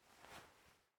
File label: Drop Record; raw game audio files; Mita; MiSide